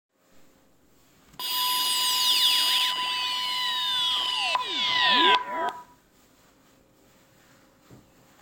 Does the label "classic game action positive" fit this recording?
No